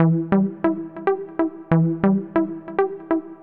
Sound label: Pong Loop 140; Audacity; loops